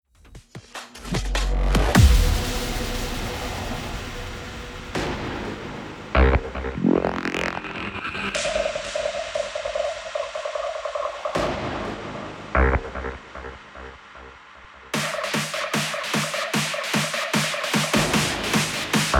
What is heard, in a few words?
techno boom; Robles; Bases Makina